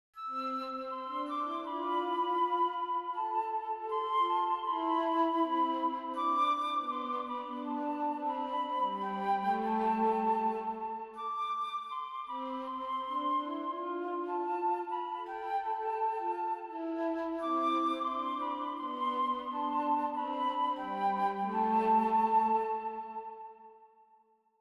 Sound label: the woodwind song